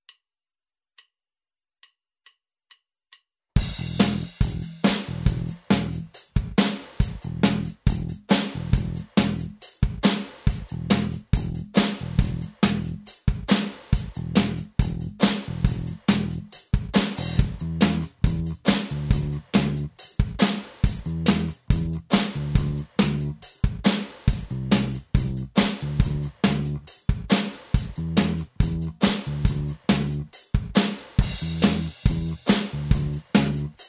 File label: solo section, guitar backing tracks